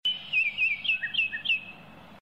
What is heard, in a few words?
bird; grade1; nepali; shabda ra chitra chinau